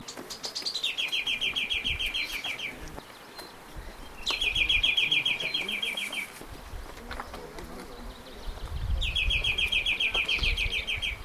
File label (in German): felsenkleiber